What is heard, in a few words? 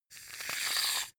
shaving cream dispense